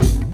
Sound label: Kick Drum, Drum One Shots; Sonic Foundry (Sony Creative Software); Ambient Grooves; Kicks